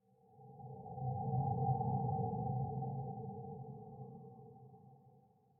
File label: music - calm - future ambient random; You Are Empty